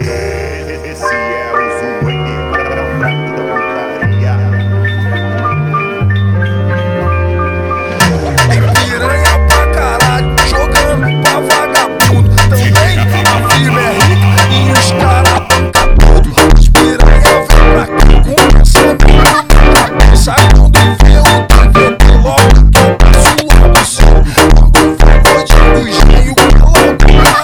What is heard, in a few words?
FUNK